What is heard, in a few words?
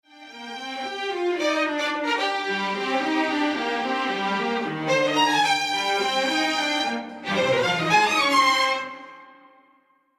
Imager Rotation R